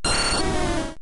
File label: cry; Missingno